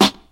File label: clean snare sound g key